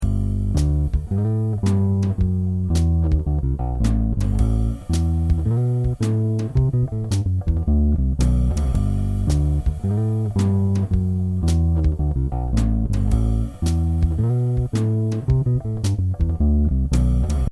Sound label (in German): viking stellung 3; hagestrom